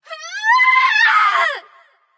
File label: battlecry light; smite; voicepack; hel